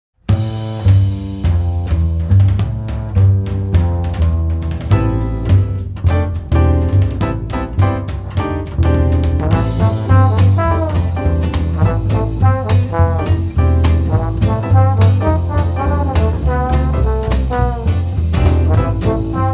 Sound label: big band